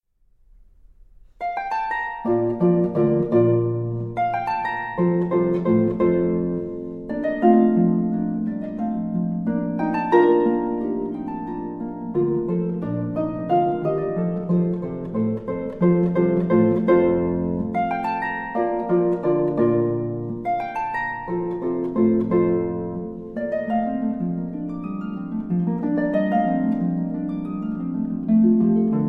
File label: Harp Concerto; Handel